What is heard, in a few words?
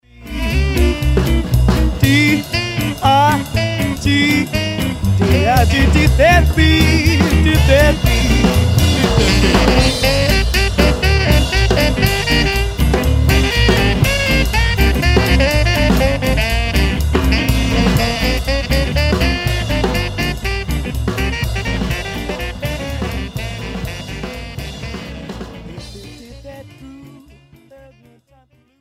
dig that beat